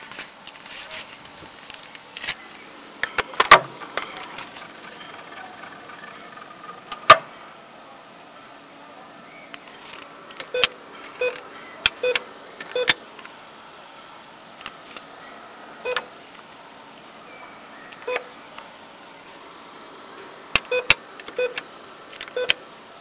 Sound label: Atm